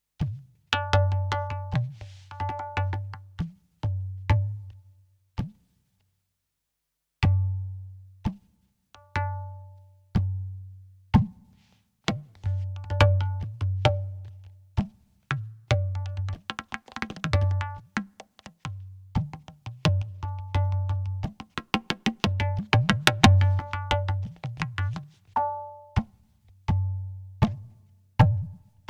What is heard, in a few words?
wavedrum; battlecomp vintage